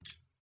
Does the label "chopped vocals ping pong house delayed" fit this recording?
No